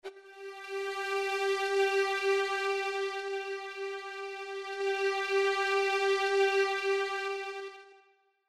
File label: strings tech; 抑揚をつけたストリング; ストリングス